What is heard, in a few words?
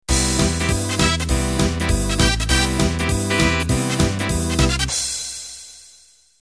Quickstep intro